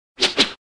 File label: Whip swish quick